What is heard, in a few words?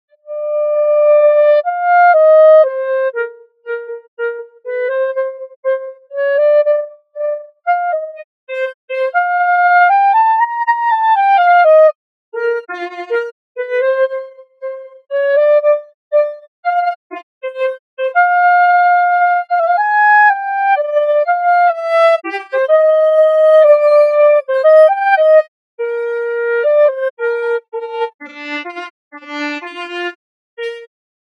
mozart solo